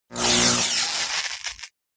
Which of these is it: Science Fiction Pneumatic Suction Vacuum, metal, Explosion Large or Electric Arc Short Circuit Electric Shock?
Electric Arc Short Circuit Electric Shock